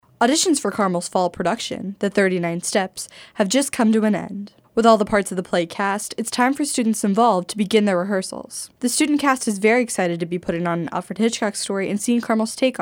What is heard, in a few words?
BEAT